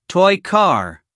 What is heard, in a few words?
toy car